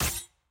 skill hit; gwen; skill 1

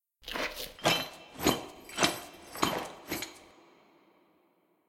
anim walk window look out

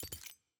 button toggle; parties

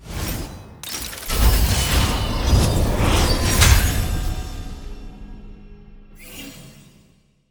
tier crystals promotion; challenges; diamond

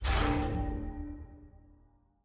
ui items button hover

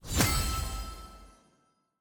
reward toast

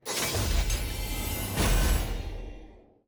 loot open outro

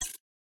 ui abilities click

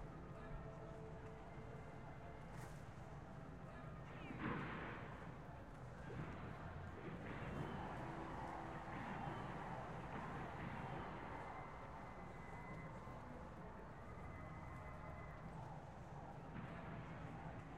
ambience loop

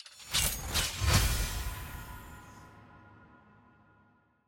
portal open masterwork intro; loot; lol

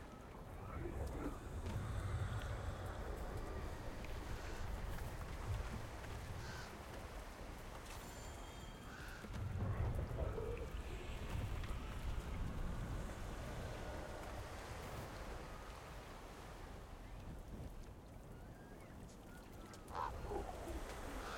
amb noxus harbor

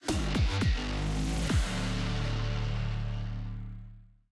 mus defeat

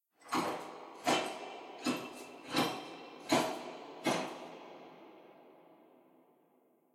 walk normal

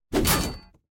attack blocked

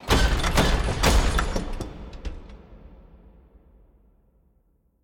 bang

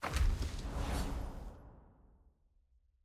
sfx ui collection record open click; jayce

